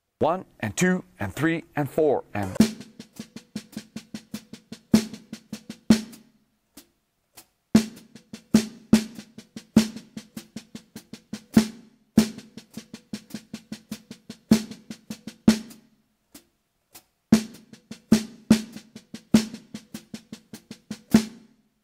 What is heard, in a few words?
Melody Triplets